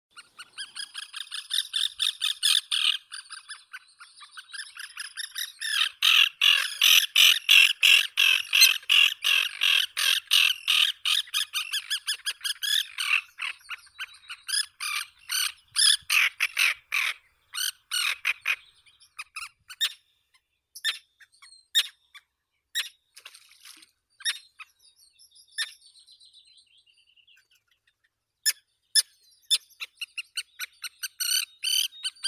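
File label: common tern, Sterna hirundo; krachka rechnaya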